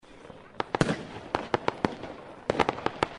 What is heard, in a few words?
rockets Expl, fireworks